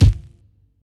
long kick single shot g key, kick drum single shot tuned to the G note